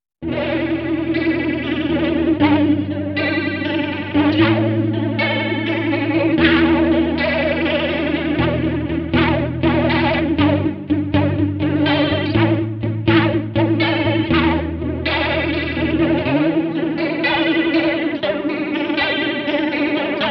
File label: Maxon VJR9 tremor